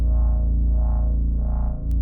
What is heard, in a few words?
beam Heal; beams; Mindustry